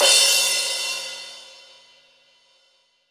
Crash, Cymbals; Roland BK-5

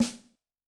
Cool Dry, Yamaha DTXpress-II snare

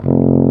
BRS F TUBA; Roland L-CDX-03

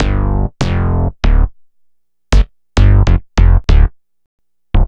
Boogie Bass, Synth Bass Loops; Sonic Foundry (Sony Creative Software); Groove Elements

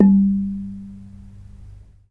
RANAT THUN G3; Spectrasonics - Heart of Asia CD2

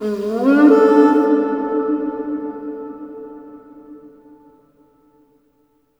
HRNPHR, Heart of Africa; AMB. HRN FX

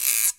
EQ Perc, Percussion Mix

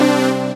Dance Mega Synth; Best Service; 90 s Sample CDs

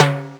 perc, Yamaha DD-8

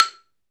MARIMBA E 3; AKAI S-Series CD-ROM Sound Library VOL-7; MINI MARIMBA

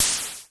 NOIZ, Dual Percussion Synthesiser; Klone

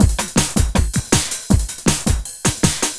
LOOP, Eurotech; Sounds Good

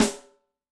RIM ouch!, Yamaha DTXpress-II snare

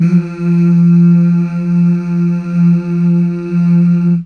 Mmh Male; Best Service Gigapack 1

